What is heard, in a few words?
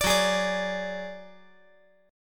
F sharp Suspended 2nd Sharp 5th; strummed; JGuitar